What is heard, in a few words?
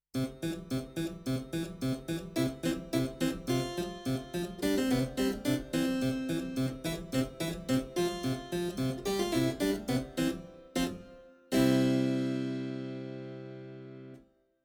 NU1 Harpsichord